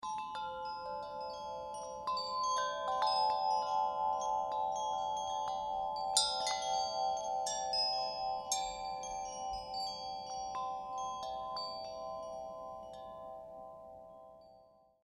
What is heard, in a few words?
majestic double chime